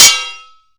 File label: hit metal metal; Mount&Blade Warband